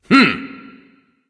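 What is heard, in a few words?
non-verbal heh heh; smite; voicepack; houyi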